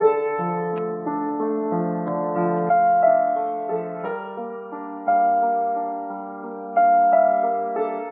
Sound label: piano, sad loop